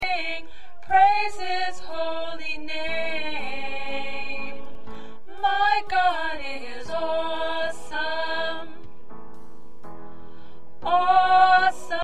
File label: Alto